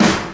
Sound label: lamebrain, Snares Knocks